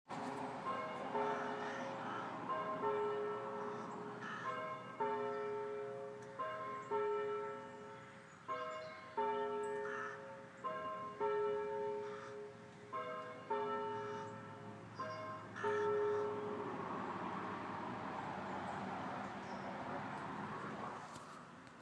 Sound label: church bells